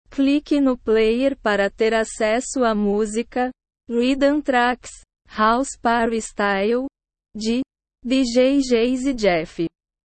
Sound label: rhythm trax house party style